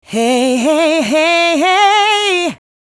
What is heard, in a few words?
Hehehe, Vocal Phrases; Ultimate Vocals